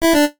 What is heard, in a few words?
jingles NES; Kenney's Sound Pack; Music Jingles